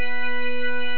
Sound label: doppler up